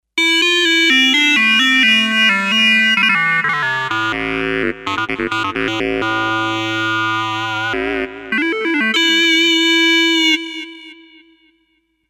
Squared, Kawai K5000S

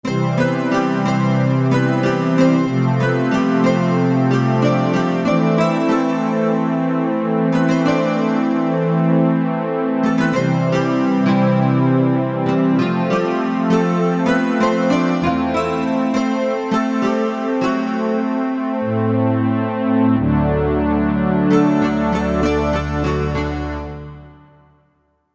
Str 12 Guit, Korg MSC-1S; Korg MSC-1S Variety

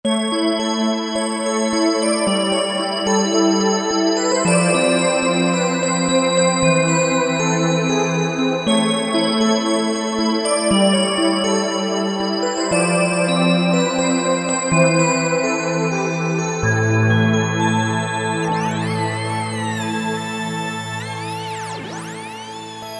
Bell Erastica, Roland V-Synth; Factory Patches

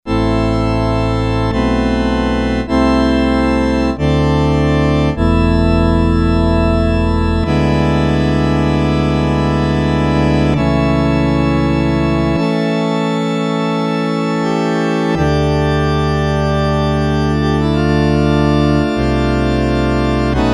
cathedral organ, Korg DW-8000; Factory Patches

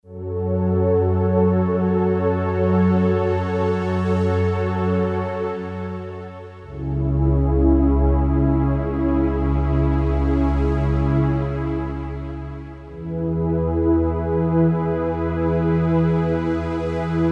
OB SOFT PAD, Roland SC-880; Factory Patches

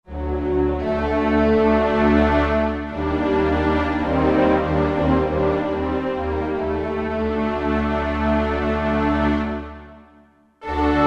Strngs & Horns, Roland SR-JV80-16 Orchestral II

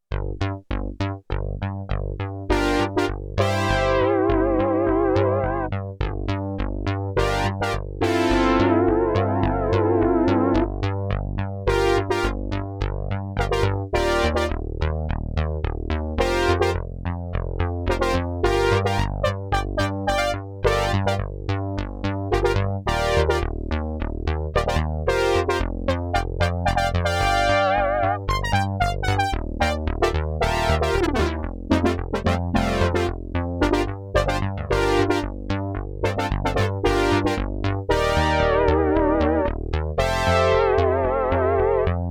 BASS & BRASS; SIEL DK 80; Factory

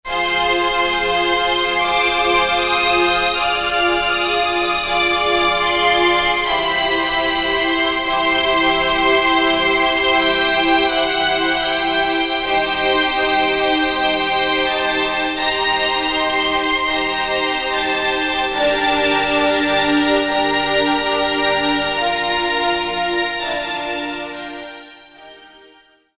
STRINGS, Korg DSS-1

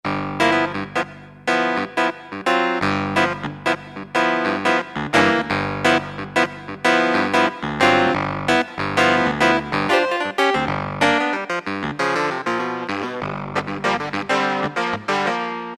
Tradclv, Yamaha TG77; Factory Presets

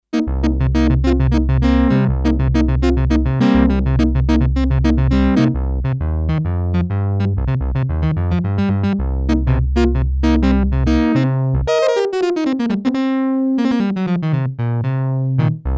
Clav 3, Sequential Circuits Six-Trak; Factory Programs

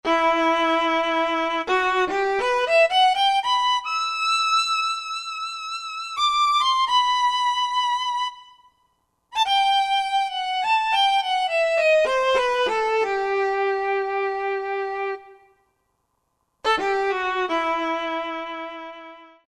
Solo Violin, Roland SR-JV80-16 Orchestral II

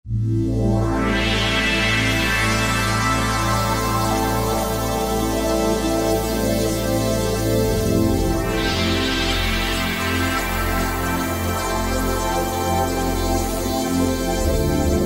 WAVE STATION

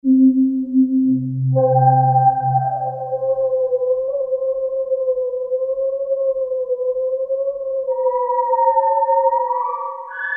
bull roar; Korg MSC-10S Ethnic

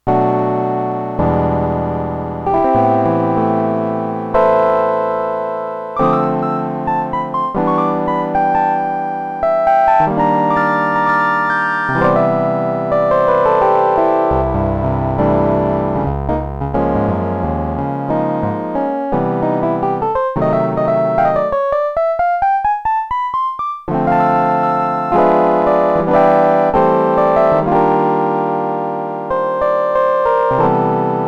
High Piano, Multivox MX-20

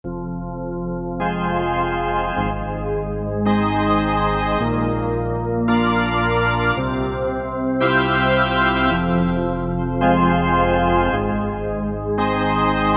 Ensemble, Korg MSC-9S Organ

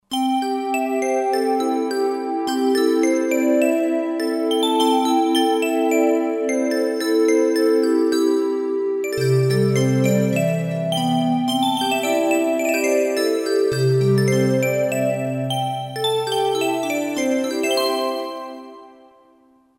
2600 TRIbell, Vintage Synth; Roland SR-JV80-04

